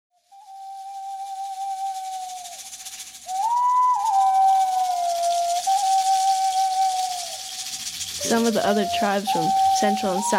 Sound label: Ocarina